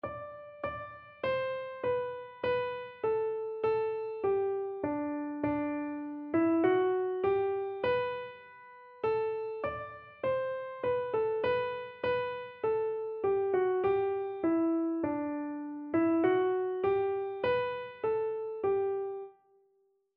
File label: king of love, keyboard